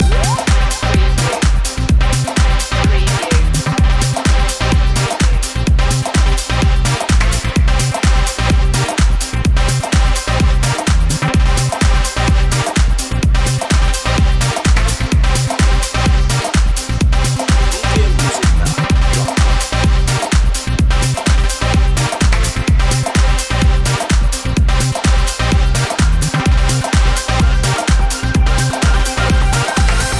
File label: beat loop